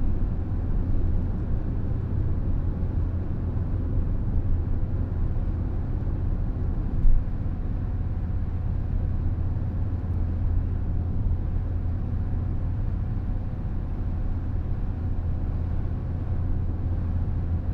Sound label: Car noise